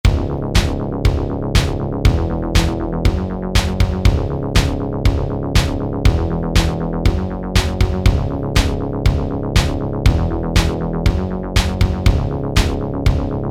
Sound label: Techno